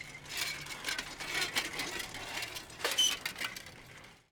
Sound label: creak sound; anemoi